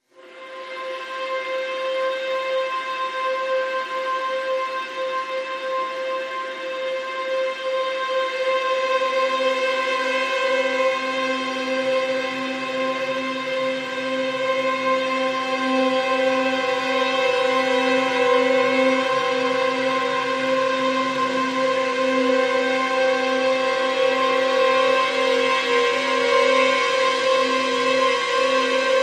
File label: Pads Uneasy String C